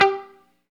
GTR X MUTE, Chunk Mute, Funk & Mute; 90's Sample CDs; Club-50 - Foundations Roland

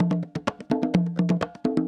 Percussion Loops, Bongo & Conga; Houseworx

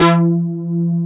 PROPHET5 SYN, SYNTH; East West - Global Sounds; USA SYNTH

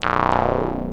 T WAVE, Synth Waves, Techno Trance

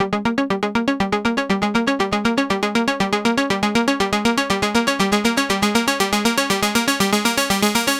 Progressive Synth, Trance

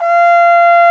F5, BRASS

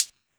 closed-hihats, techno; Techno ID; single-drums

